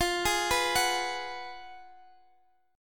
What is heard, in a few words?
F sharp Major 7th Suspended 2nd Suspended 4th, F